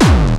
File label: bass, kicks, Hardcore